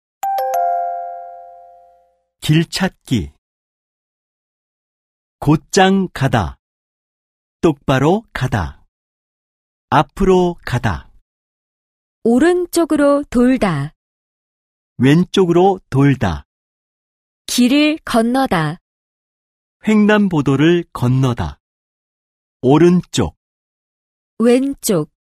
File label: K-POP